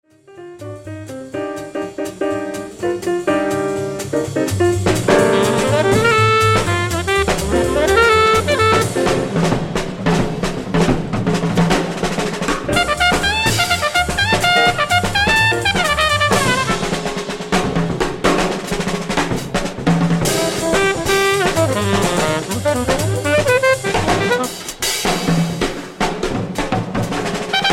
drum solo

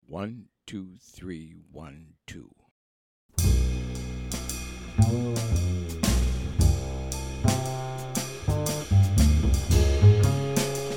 minus Piano

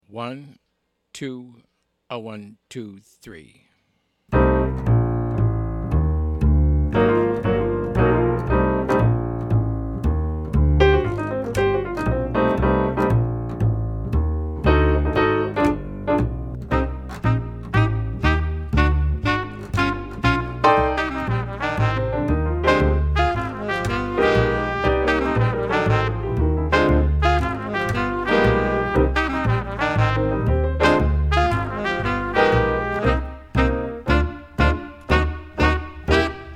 minus drums

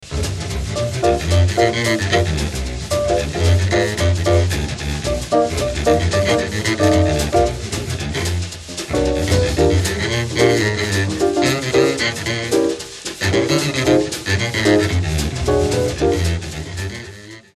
solo

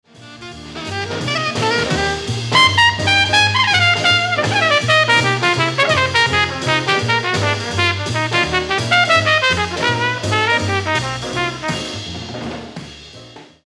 solo

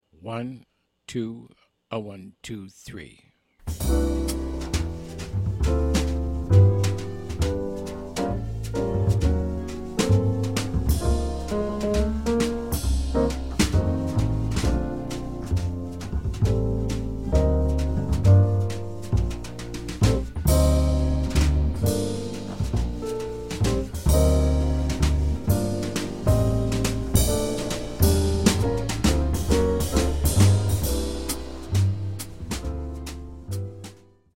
minus melody

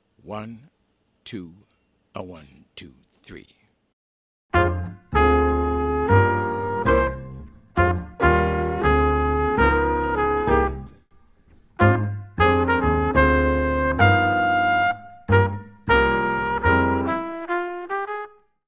medium slow minus Drums

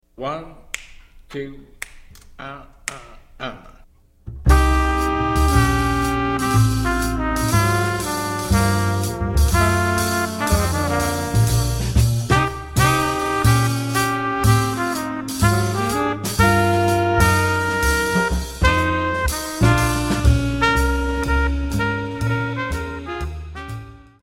QUINTET no piano

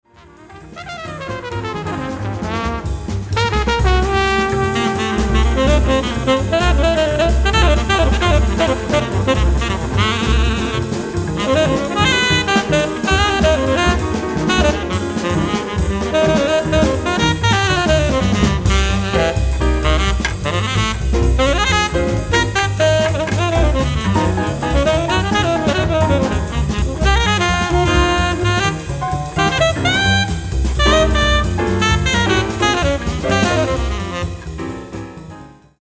Tenor Sax Solo

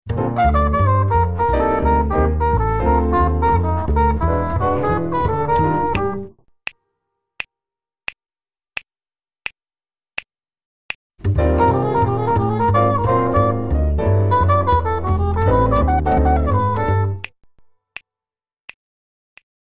minus drums

